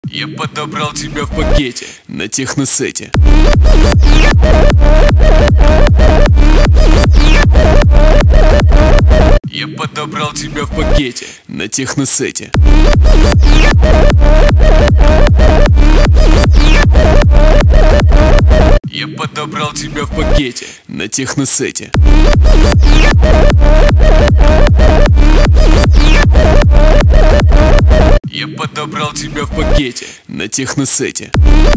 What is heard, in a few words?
techno rave